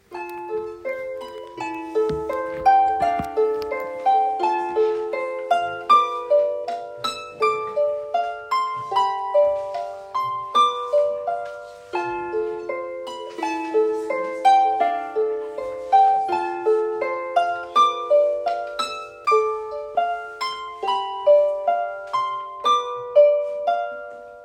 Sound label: harpe